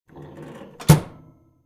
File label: oven door close